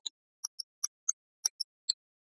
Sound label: Glockenspiel